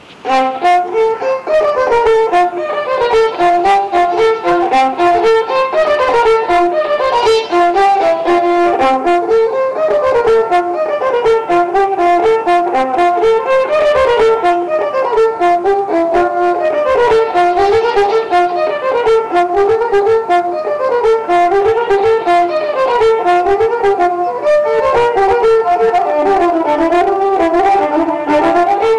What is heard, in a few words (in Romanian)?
Taraneasca, joc popular vasluian; Centrul Judetean pentru Conservarea si Promovarea Culturii Traditionale Vaslui